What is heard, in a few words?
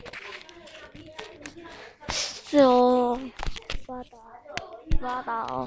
sea